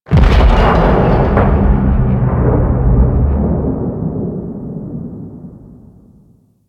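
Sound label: Ship Distant Damage Explosion; Ambience; Warframe; Corpus Ship Remaster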